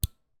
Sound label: Lock, ui; Soong